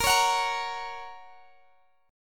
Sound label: A sharp Minor Sharp 5th A sharp down Guitar Open G